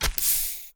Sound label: healthkit use; 2D Platformer; Equipment